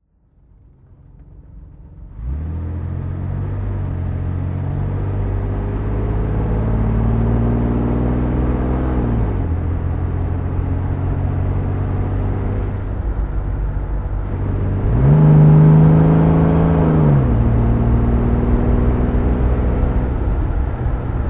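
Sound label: jaguar xk150dhc automatic kickdown, Engine sound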